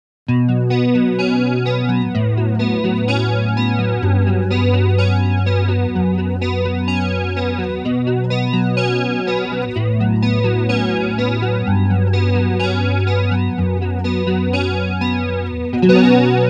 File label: Flanger